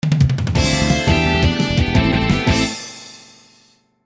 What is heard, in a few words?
battle win